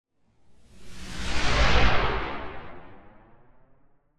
Passing Space Ship - Buzzing Engine; Hollywood Edge; sound designer's toolkit v2

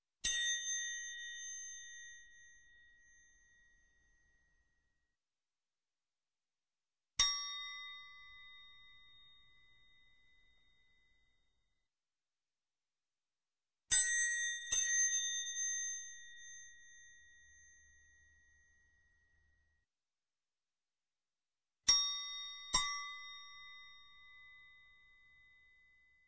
Triangle single Hit, Music, Percussion; Sound Ideas 2000 Series

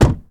Truck Dakota Door Close; Trucks

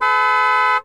Chrysler 300M Horn, Automobiles

